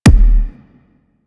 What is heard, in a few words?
Grenade Punch Common One; Warframe; Venus; Venus Grenades; Venus Grenade Punch Layers